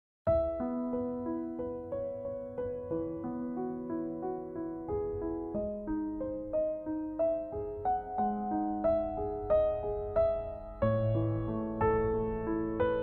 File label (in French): Chant, Chargée d'émotions - Anglais